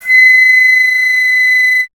flute B; Miroslav Vitous - Solo Instruments VOL-2; Solo lnst. II

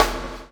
CHAIN; Spectrasonics - Backbeat

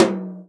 tom, Real Drum Kit, jungle kit

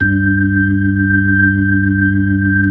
B-3 Chorus

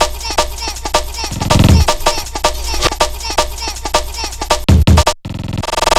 160, Drum Loops; Total Drum&Bass

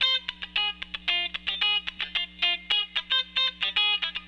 Raw Funk Guitar; Sonic Foundry (Sony Creative Software); What it Is! 70s Analog Funk

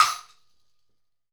Wood Block; Essential Percussion

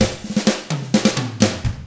127 SURF ROCK

